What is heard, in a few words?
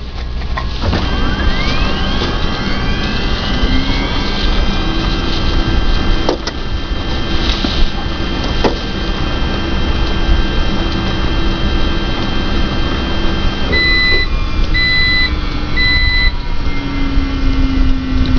printer